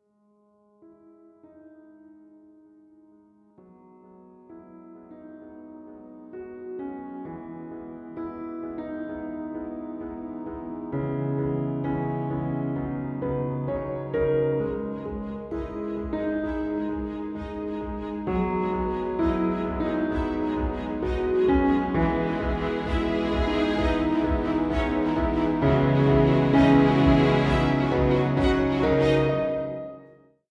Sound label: Dramatic emotional intro